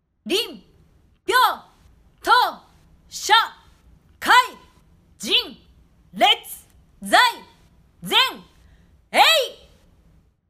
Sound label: voice kuji